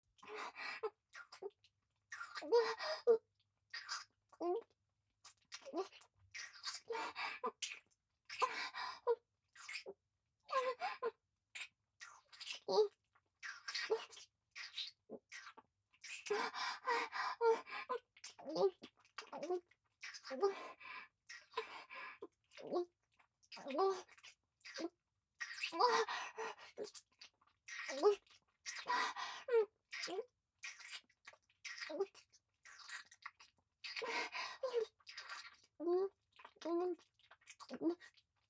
正面近い・ディープキス・普通; リーシャ

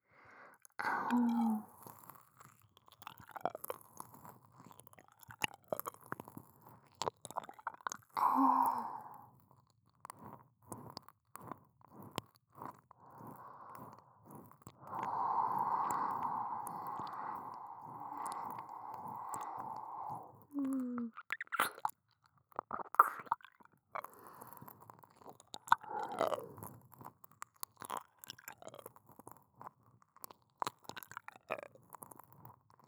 左耳舐め, ASMR; アドリブ集

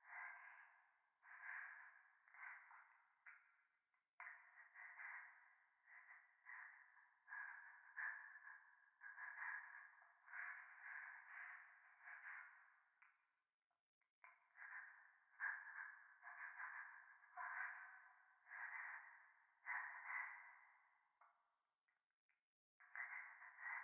教会 右耳快感吐息

